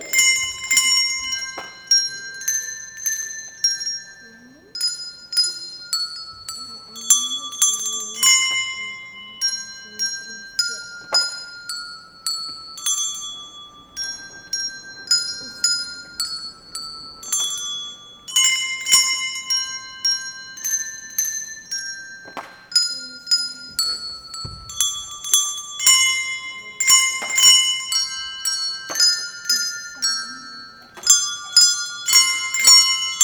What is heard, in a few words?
handbell